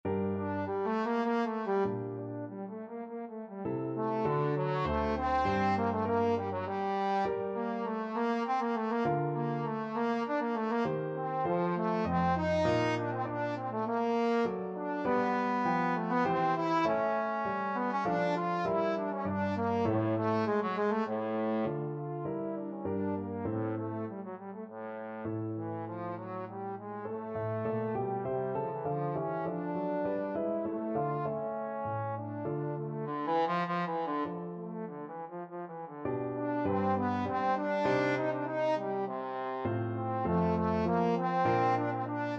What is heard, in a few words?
sonata, trombone